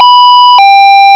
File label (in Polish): syrena